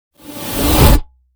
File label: fire trap